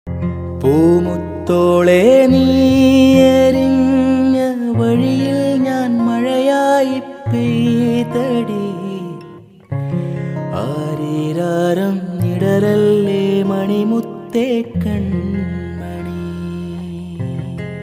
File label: Malayalam Ringtone